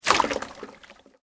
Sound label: Sweep Organic Fast